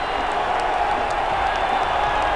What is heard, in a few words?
CROWD; LEGEND98